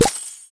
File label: flipper, pinball; Vital Garden